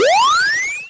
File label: Science Fiction Sci-Fi Electronic Warning Siren Once